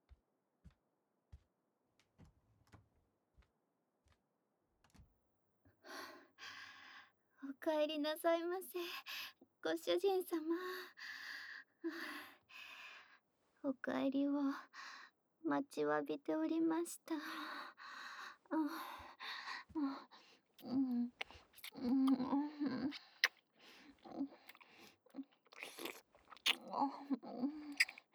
お耳ディープスロート; ASMR; おち●ぽイライラ♪; 服従度：★★

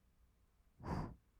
ふ, ブレス, 吐く音, kawaii voice; ASMR; 1200 kawaii voice!!

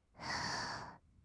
吸う音, ブレス; ASMR; 1200 kawaii voice!!

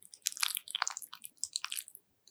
くちゅ音

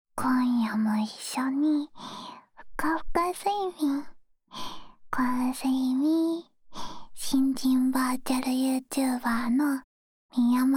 ジェットバス・炭酸マッサージ; ジャグジーあわあわ一緒にお風呂…いかがですか？

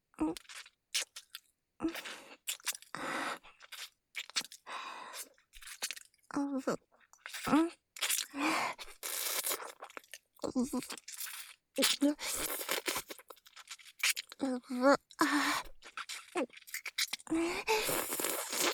フェラ(口交)

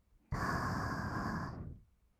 た, 吐く音, ブレス; ASMR; 1200 kawaii voice!!

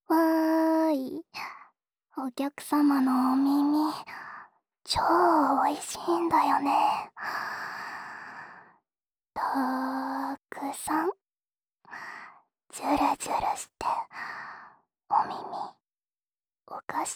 耳舐め 右, ASMR

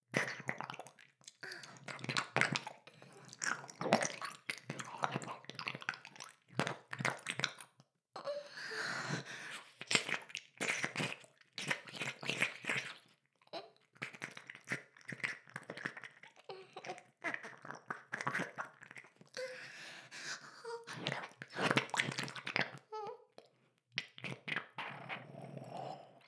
耳舐め音声; LR逆ver; ダメなメイド

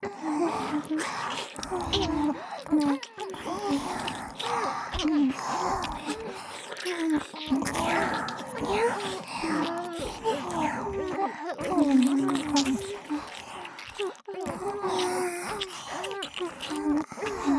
Wにゃんにゃん耳舐め＋Wフェラ

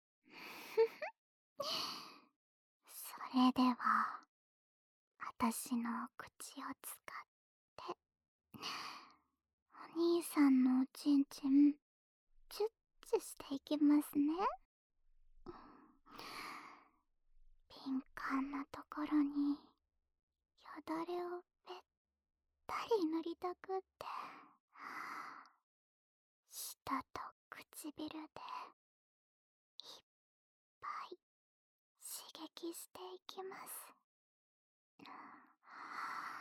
オナサポ用フェラチオループ, ASMR

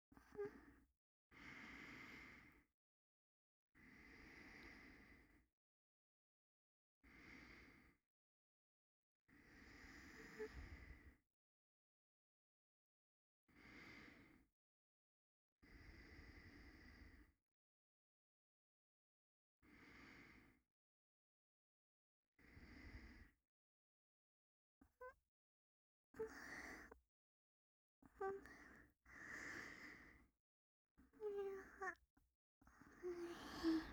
寝息ループ; ASMR

